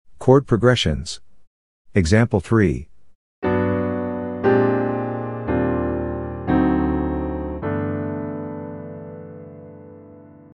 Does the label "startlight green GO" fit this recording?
No